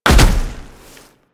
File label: Land Heavy Snow, Footsteps; Warframe; Tenno